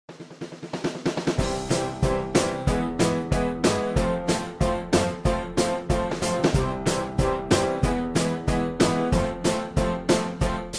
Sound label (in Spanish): Música Positiva Y Alegre